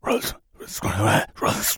mumbling, infected; npc; idle; szf

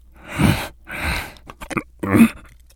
idle breath, infected breathing; npc; szf

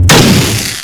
flechette explode; grayworker

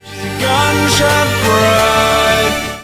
airshot

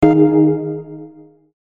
event mild jingle

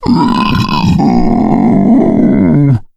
male boomer lurk, voice, idle; szf; player

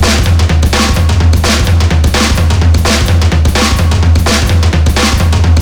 drums, horde; music; szf; zombat

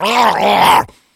rage at victim, infected; npc; szf

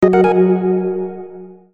event mild big jingle

